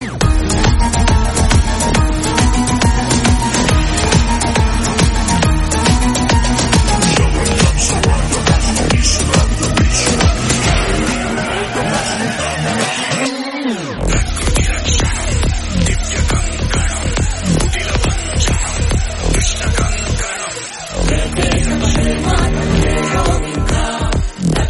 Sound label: Krishna Trance Instrumental BGM Ringtone; Karthikeya 2